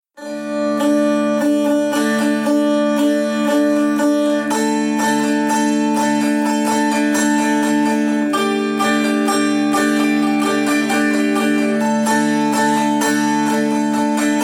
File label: dulcimer